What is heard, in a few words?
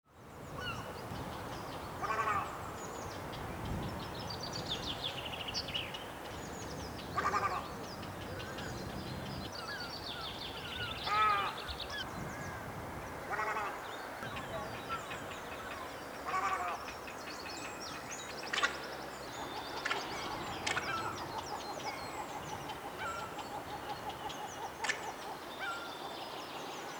Little Egret